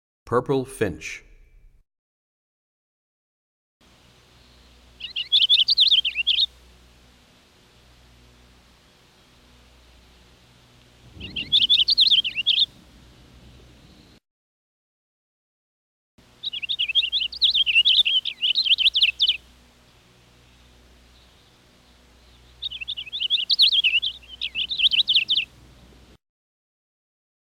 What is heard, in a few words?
Purple Finch; Animals; Birds; 101BirdSongs